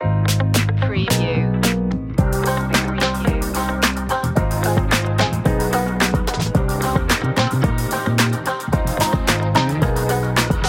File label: A glamour electro pop, with cool guitars, funky bass, groovy h; glam style; urban-rnb; hip-hop